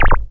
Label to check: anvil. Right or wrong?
Wrong